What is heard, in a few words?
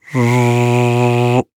Cartoon Weird Noise Short Cartoon Bee; AdobeCC